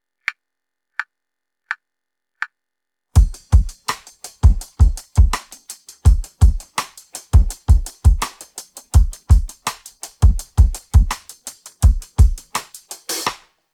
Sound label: pattern, drums